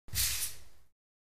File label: Ping Pong Paddle